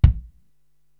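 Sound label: BD Soft, bass drum, drum machine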